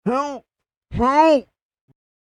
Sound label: Help Muffled Prisoner Screams; Talking Sound Effects; horror sound effect